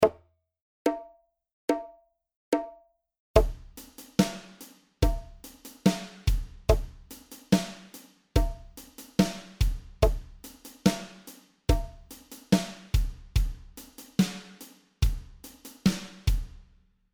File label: Etudes de Grooves